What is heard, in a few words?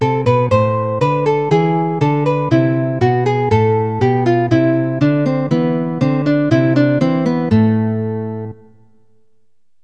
nylon; Roland; An Invitation To The Roland World Of Music